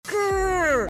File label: lightning grrrrrr